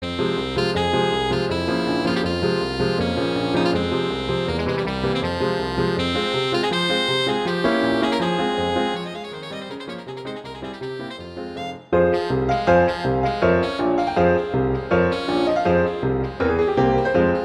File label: Allegro; Brahms; Hungarian